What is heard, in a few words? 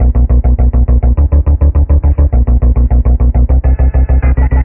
creeping in stabs; Loops; Electronic